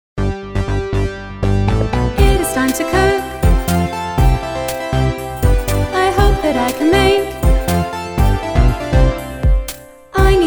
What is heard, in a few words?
Karaoke Version